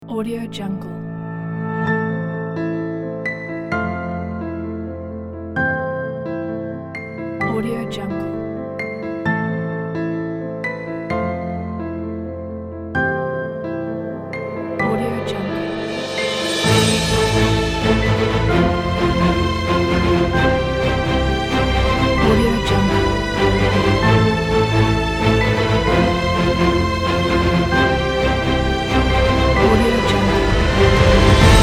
cinematic epic motivational hope

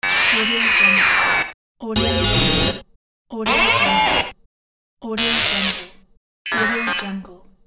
cyborg moving

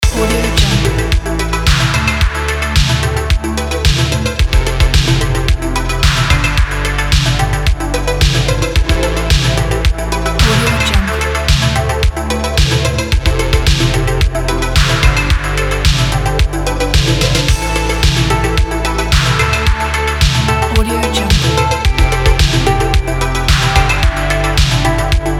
synthwave smooth

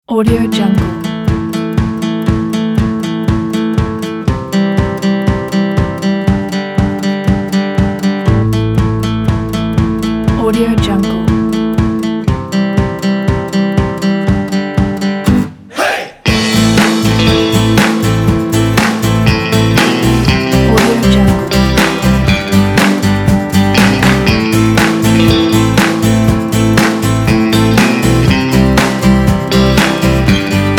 cowboy country

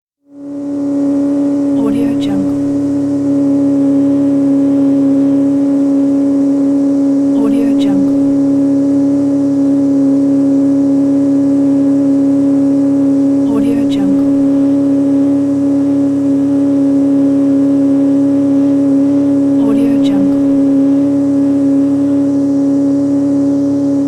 motorboat driving along; wistansound

